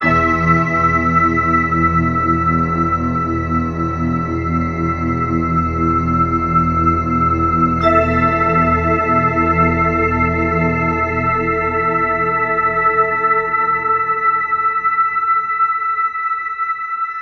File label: metal ruins, Loops Electronic